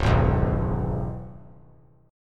Db Minor 7th Db down, chord; strummed; JGuitar

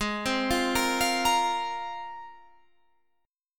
G sharp 6th Add 9th G sharp Guitar Drop D

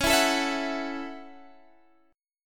A 6th C sharp

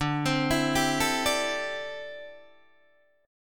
D 9th Suspended 4th D down Guitar Open D

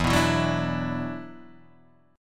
E 7th Suspended 2nd, down

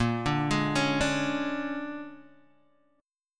A sharp 7th Sharp 9th A sharp down Guitar DADGAD

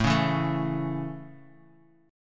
A Major, Guitar; strummed

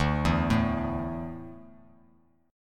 D Suspended 2nd D down, chord; arpeggiated

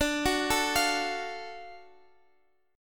F sharp Minor Major 7th Sharp 5th D

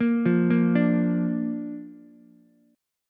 Bb Major Bb down Ukulele, chord; arpeggiated; JGuitar

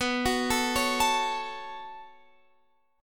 B 7th Suspended 2nd, Guitar, Open F; B7sus2; arpeggiated

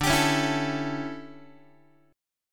D Major 7th Suspended 2nd Suspended 4th D, Guitar; DM7sus2sus4; strummed; JGuitar

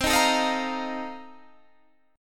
A sharp Major 7th Suspended 2nd Suspended 4th C

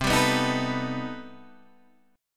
C Minor Major 13th C down Guitar Open D

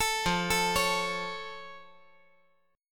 F Augmented F

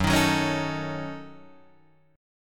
Gb Minor 6th Gb Guitar Drop D

